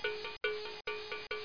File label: cowbell; 12000 Graphics Pack; Media Graphics